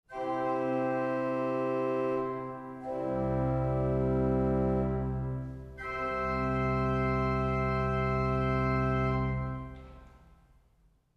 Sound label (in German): Geigenprizipal 8